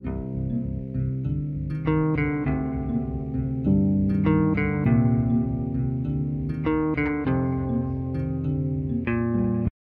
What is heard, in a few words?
Tension Loop 100bpm 4bar; project zomboid